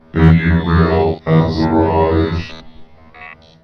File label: New Mail Cyborg